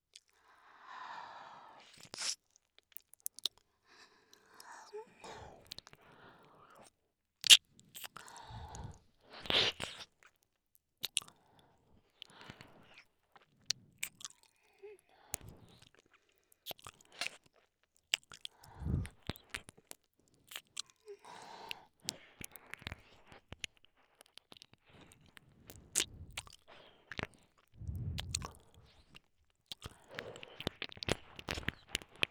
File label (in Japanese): 耳舐め, ASMR; SEあり